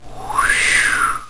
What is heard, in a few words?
Whoosh; Square One Lite 3.0